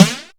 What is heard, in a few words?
Flange Snares